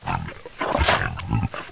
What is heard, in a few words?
Bear Snarl; Playable Tutorial Level; TR1